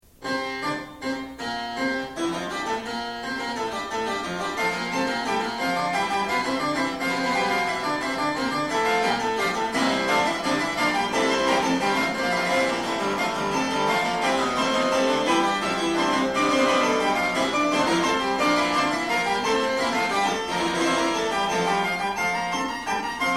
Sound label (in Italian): fuga; bach; bwv856